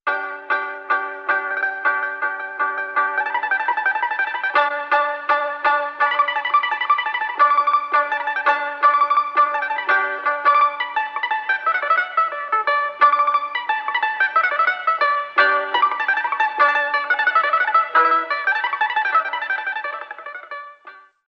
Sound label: yueqin